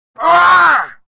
Screams Man Pained Short Yell Close Perspective; Human Sounds

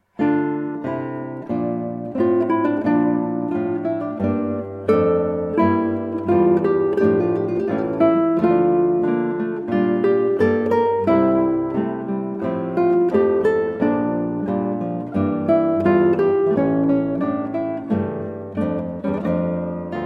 Largo, Guitar

